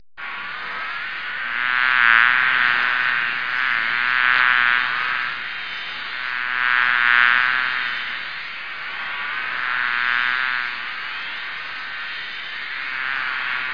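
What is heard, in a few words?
bee swarm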